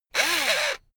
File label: electric drill screw in short; maschinen; bohrer; soundpool